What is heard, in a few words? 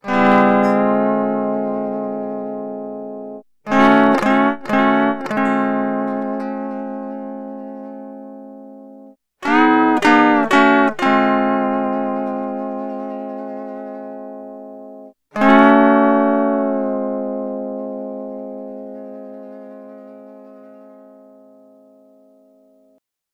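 Sunrise Guitar Chord C Dry, Lounge; Zero-G Kocktail Kollection; Construction Kitz (Lounge); Sunrise Mixes 082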